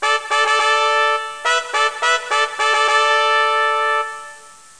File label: fanfare